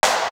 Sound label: Drum, Drum One Shots